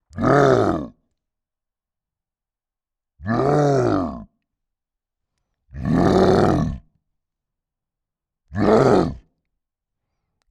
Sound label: Tank Multiple